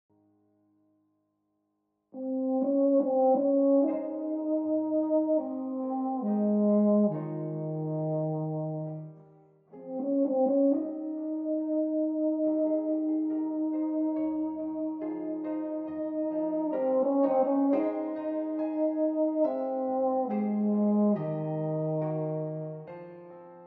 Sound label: Euphonium; یوفونیوم